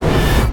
Buildup; smite; horus

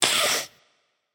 ouch light; smite; voicepack; hel

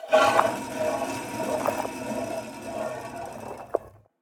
defeat spin; smite; kuzenbo

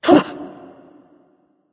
surprised; smite; anubis

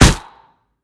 hit; smite; tiamat

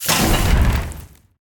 pet deploy; smite; vulcan

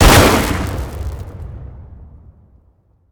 deployable explode; smite; raijin

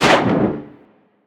fire; smite; scylla

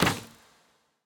roman ranged bow fire; smite; npc; roman archer

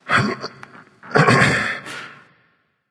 nv grunt lift; cernunnos

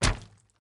lobby victory hit; smite; poseidon

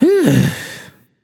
sigh, voicepack; smite; jorm

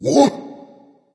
confused dragon; fafnir

